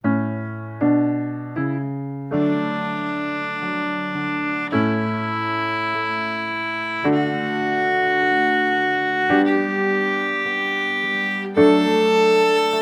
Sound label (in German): Tonleiter in D